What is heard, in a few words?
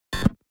UI invalid sound